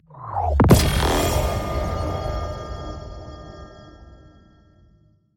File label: Exotic item collect